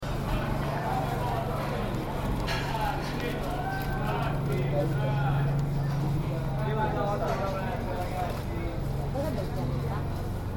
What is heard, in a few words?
Market, Ambience